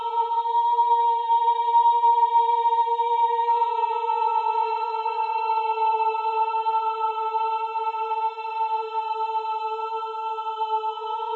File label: Mimic Loop; Lurker; Evolve; Gorgon